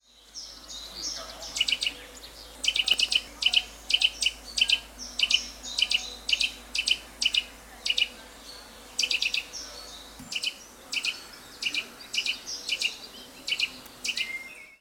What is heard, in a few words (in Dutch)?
Boomklever; lente